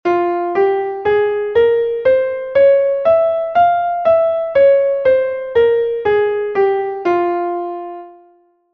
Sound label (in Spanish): fa menor armonica